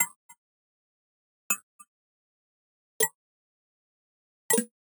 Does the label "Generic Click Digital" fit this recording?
Yes